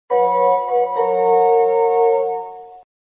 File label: chime; keio; ttc